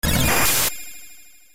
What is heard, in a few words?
bolt; ff3; ff3us